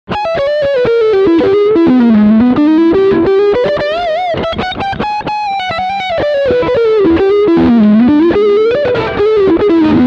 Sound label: 6 String Lead